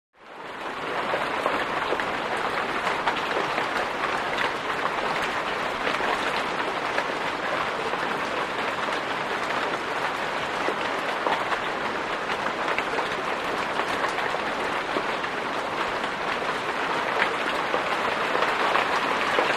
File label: Rain Medium Heavy, heavy